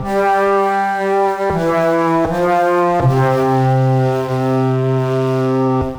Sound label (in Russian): Flute, даунбит